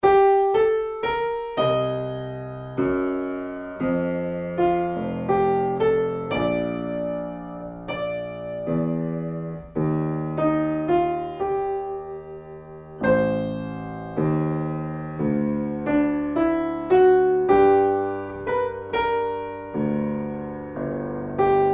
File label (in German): Klavier vorher